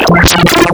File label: monowave